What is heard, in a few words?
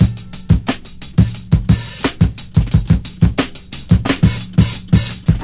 88 bpm breakbeat a sharp key; drum loops